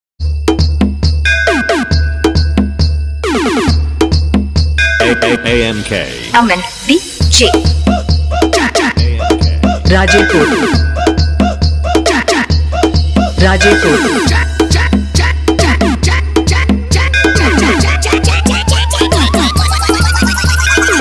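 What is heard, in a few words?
Train Horn Music, Hard DJ Vibration Beat; Navratri Special; Comptition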